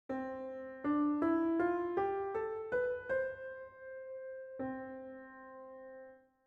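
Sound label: C major scale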